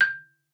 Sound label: MARF G6, MARIMBA; Xsample VOL-4